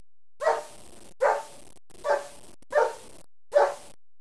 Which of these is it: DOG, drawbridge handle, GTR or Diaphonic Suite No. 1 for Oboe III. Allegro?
DOG